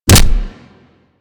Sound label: Generic Ability Impact; DE; Warframe; Warframes